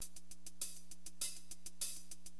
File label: Drums, Acid Jazz Funk; LiveAct Pool